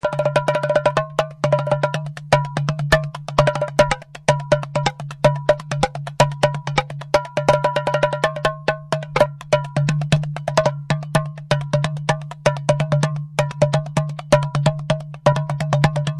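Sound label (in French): condor clair, tambours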